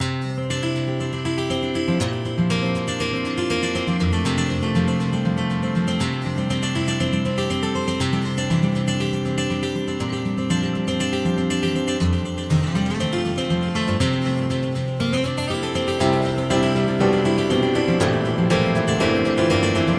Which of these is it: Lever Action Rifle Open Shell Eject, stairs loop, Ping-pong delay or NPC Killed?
Ping-pong delay